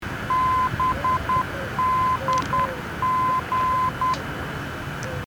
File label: 389KHZ, NDB Beacons; Bydgoszcz; Received in Torun